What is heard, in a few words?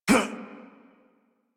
Pain Adult; Warframe; New War; Adult Operator